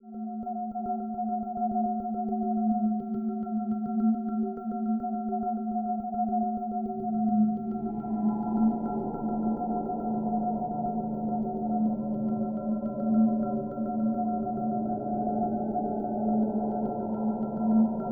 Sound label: Spooky Ambience